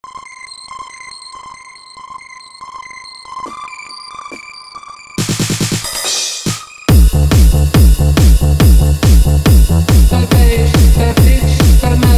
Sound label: bass beats and melody